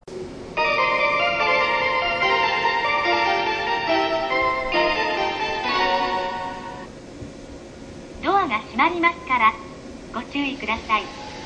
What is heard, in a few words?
melody, station